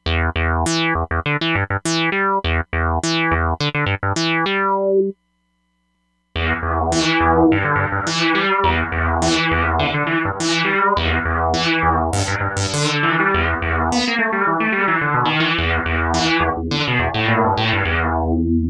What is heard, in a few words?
Modulated Gate, Roland DEP-5; Factory Patches